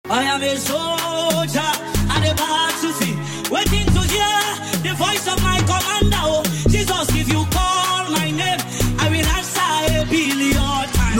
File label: Fast Version